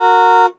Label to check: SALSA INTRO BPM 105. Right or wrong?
Wrong